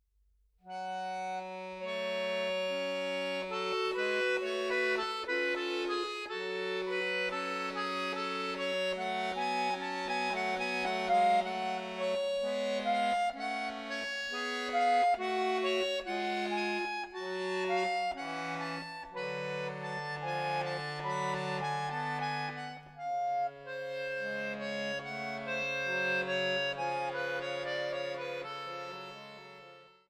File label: Prelude in F sharp minor